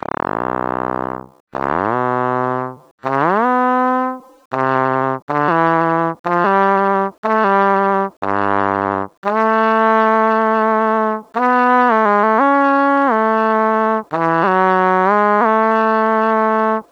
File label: mix trumpet